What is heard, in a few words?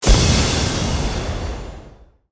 sparks big Win Txt Change; netent